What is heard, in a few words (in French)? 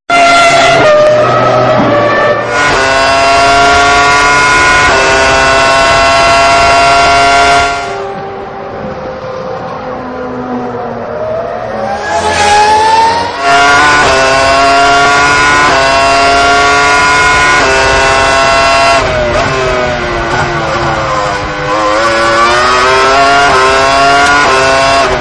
Ferrari 550 Maranello course; Sons Ferrari